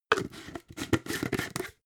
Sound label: jar glass plastic close fast